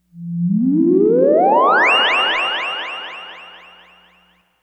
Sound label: Space Moog; SFX; Ambient; Electronic Point Blank